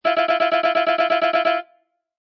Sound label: Casino Electronic Sound Payout; Casino, gambling. Slot Machine: Electronic melodic sound "Payout"